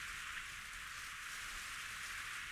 reel Spin

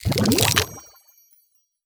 wild From Cluster; netent

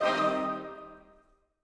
Pick And Click Rose; netent

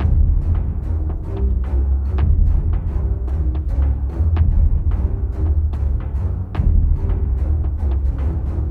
bonus Music Layer; netent

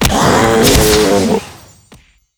Grunt Die; netent

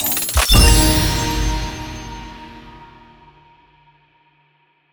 large Win; netent

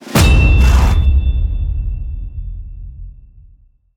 win small; netent

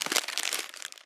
bet Slip Wasted; netent

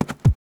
reel bounce; netent

